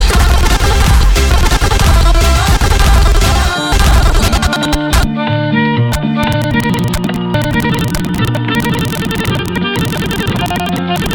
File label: jingle; hitparty